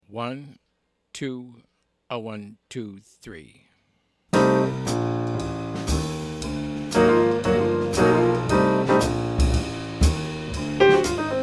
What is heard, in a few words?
minus bass